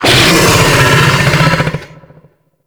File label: Infested Bone Blade Vocal D; Warframe